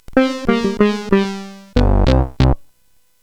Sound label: Push-It main, CSG8580R5; hvsc